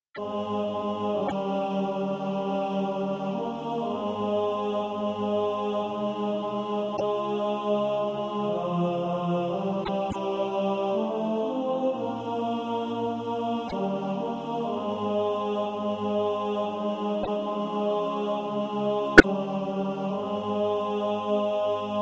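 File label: tenor; Jesus calls us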